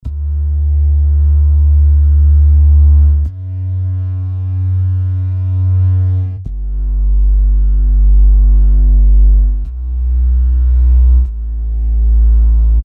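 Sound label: 75 Dmaj Neon Sub Synth Bass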